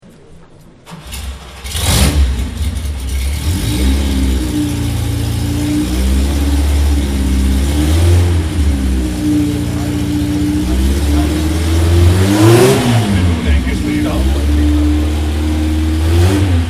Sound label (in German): Porsche 356, Motorgeräusch des Porsche 356 A 1600 Carrera; 1957